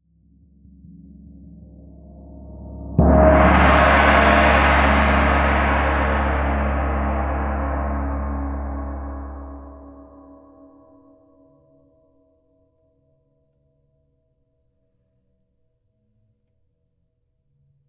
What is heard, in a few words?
Chinese Gong